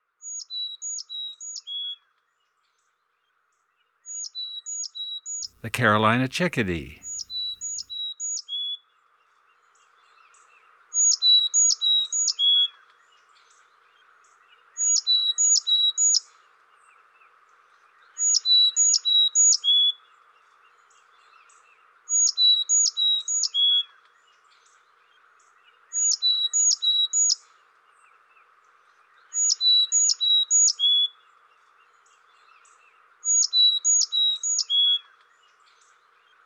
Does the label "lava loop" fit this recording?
No